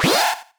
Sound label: Alarm; Free SFX for anything